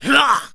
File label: zombie male attack